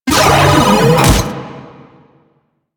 Proto Infested Mutalist Speaker Start Scream; Warframe; Abilities

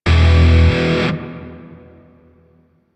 Shawzin Chromatic Chord H; Grineer; DE; Warframe

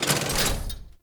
Mech Step Arm Down; Nechro Mech; Vehicles

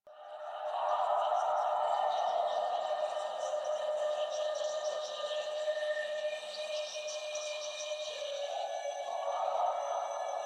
Sentient Choir; Warframe; DE; Sentients

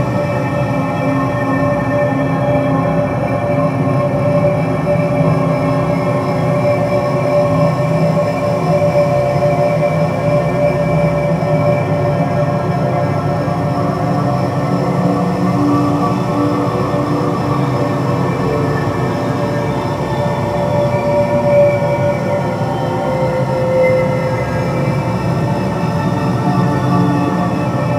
Space Trench Run Amb; Ambience; Space Battles; Exterior; Warframe

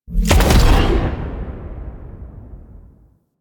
Iron Blast Cast Fully Charged; Warframe; Warframes

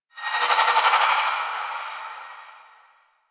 Sceptre Kuva Extract End

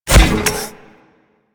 Step Default; Warframe; Corpus; Ambulas; Footsteps

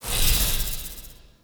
Heal; Powers; Banneret

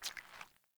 Grunt Boot Walk Water; Characters; Enemy; Melee Grunt; Footsteps; Boots; Sinecure

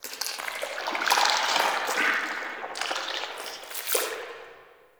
Sap Gush, Root Cave; Ambience; Neathuns Root Cave; One Offs

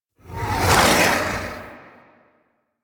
Archon Whip Ranged Attack Projectile; Warframe; The New War; Nira

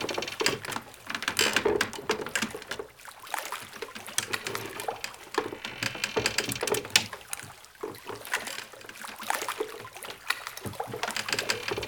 Cave Ocean Boat Rock Loop; Ambience; Midrath Island

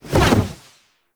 Wall Latch End; Warframe; Tenno

